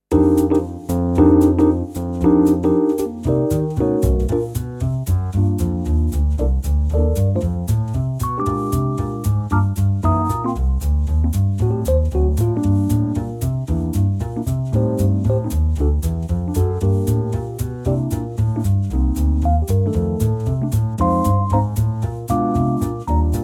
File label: no gtr